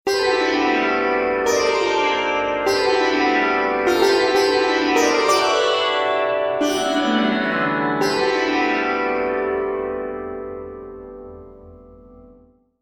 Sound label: Falling Star